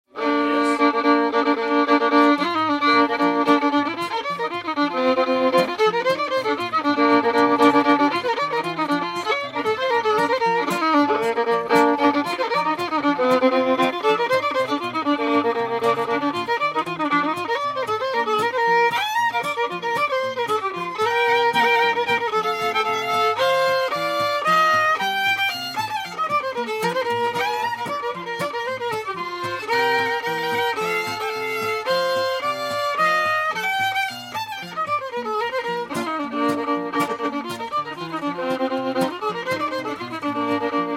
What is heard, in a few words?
Thunder Hornpipe